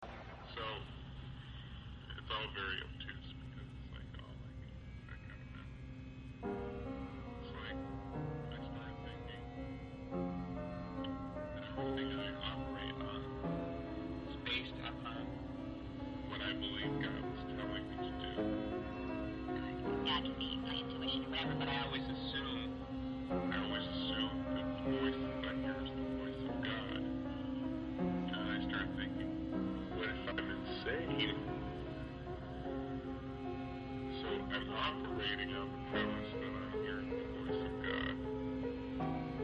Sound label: glass interlude